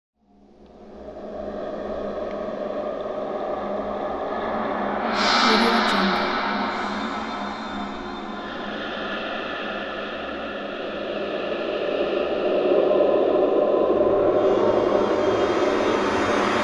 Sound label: ghost city horror ambience